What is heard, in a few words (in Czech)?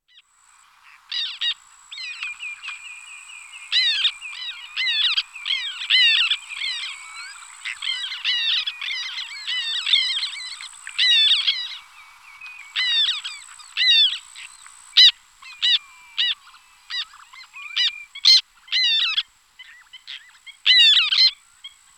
Rybak cerny Chlidonias niger